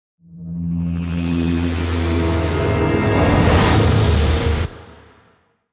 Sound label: scary piece